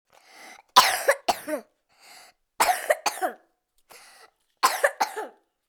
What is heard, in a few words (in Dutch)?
hoesten